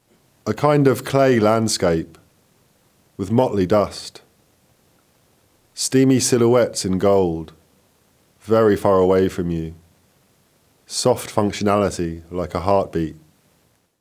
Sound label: Heartbeat